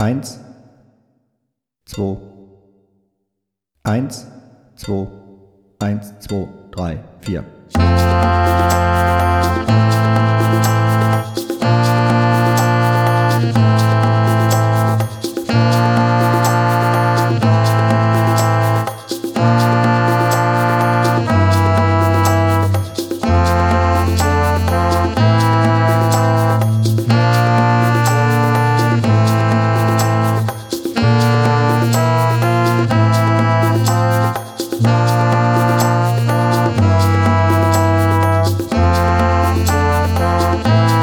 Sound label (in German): ohne Drums